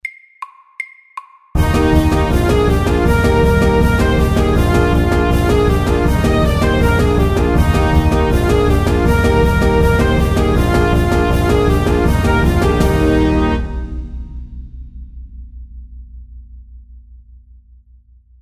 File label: Cancan FAST 160